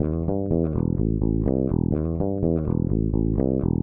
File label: DEEPHOUSE BASS 125 A